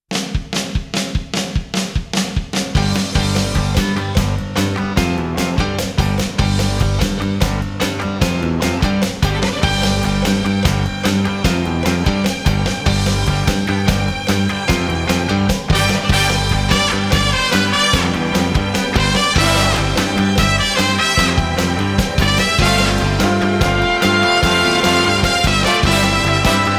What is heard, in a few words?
Background Music; Neon Genesis Evangelion